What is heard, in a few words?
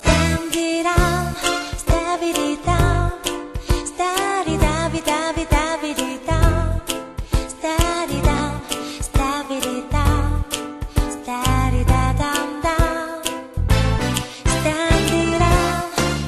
Humming